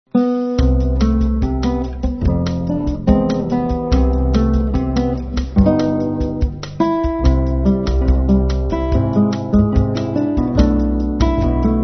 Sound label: Easy Bossa